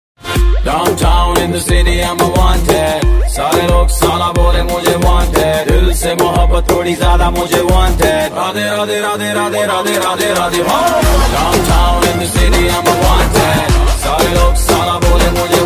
movie title song ringtone

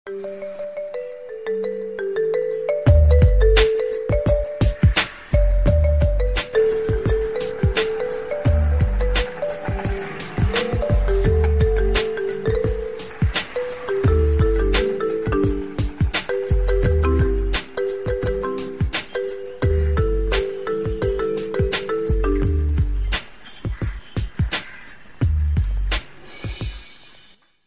instrumental bgm iphone ringtone

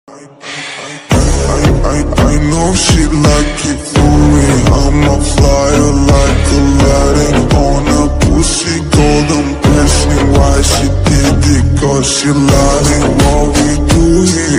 free fire best ringtone lyrics song ringtone

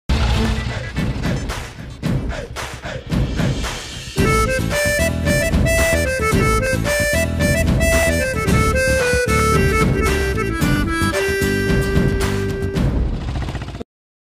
bgm ringtone with flute and drums

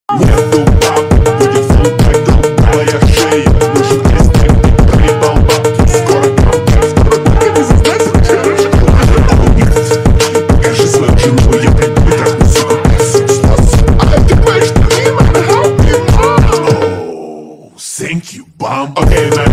phonk remix ringtone; appu

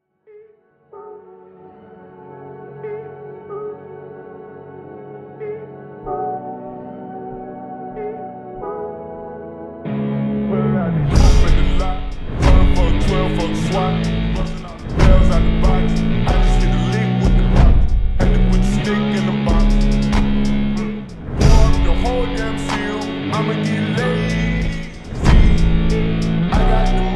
guitar remix

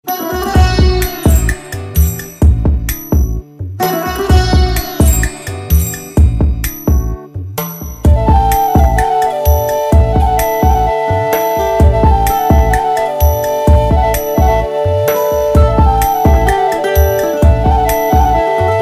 ringtone bgm; oohalu gusagusalade

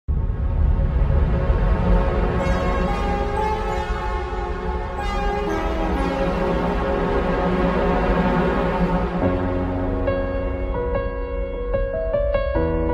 love ringtone guitar music bgm; ismart shankar; south movie

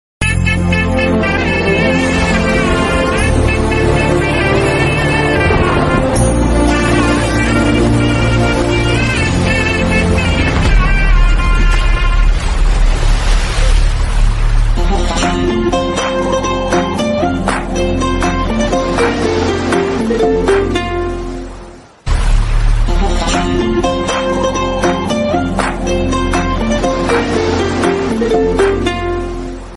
background music romantic music; uppena movie

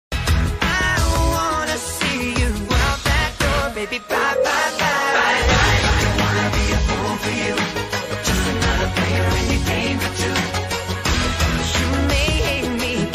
bye bye song ringtone; deadpool

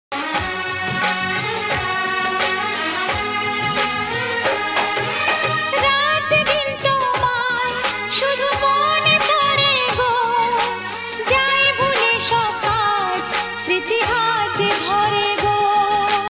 ringtone bengali

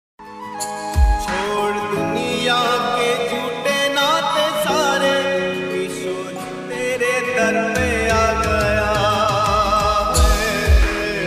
bhakti ringtone; radha rani; radha krishna ringtone

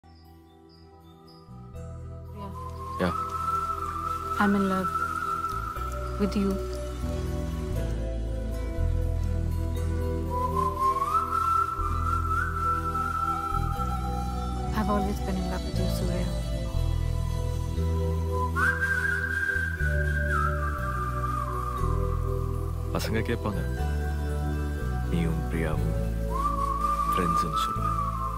love bgm; vaaranam aayiram; love scene

